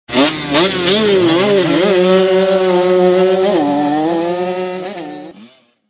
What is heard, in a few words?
moto